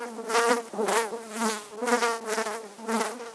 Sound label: flies, ambience; gearbox